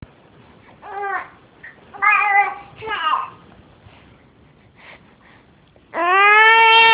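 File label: baby hungry, female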